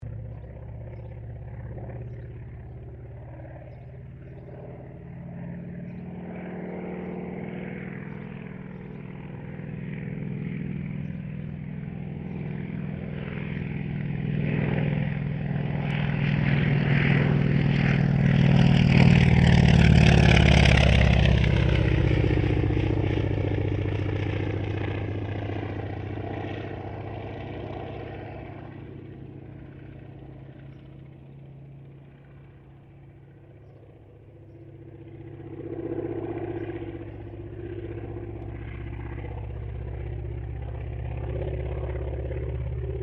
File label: AIRCRAFT CA-28 CERES PASS; Airplane; Airplanes; Vehicles